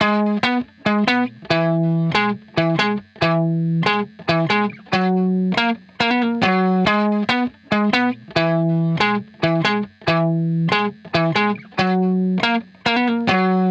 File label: 140 BPM G# Min Main Guitar; Reminders